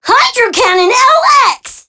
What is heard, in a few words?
Alarm Clock